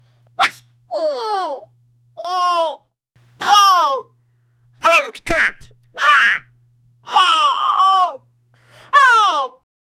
bonk censor bleep short; censored

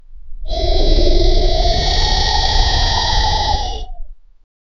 dragon using its magical

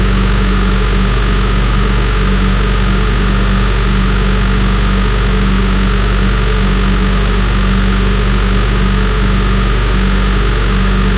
v type 2 cylinder ohv

